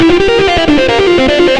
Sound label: xtra lead